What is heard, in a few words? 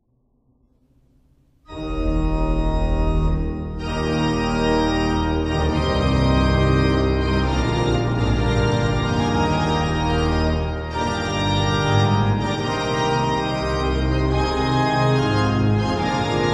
Ouverture Fireworks Schnitger organ Groningen Martinikerk; Handel